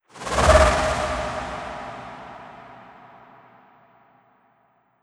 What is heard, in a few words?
whoosh